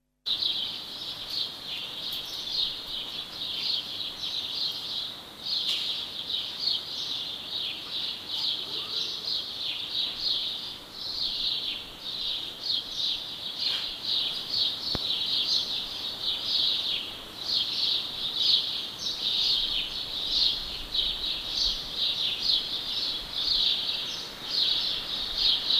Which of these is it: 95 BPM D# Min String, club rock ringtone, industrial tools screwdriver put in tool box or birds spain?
birds spain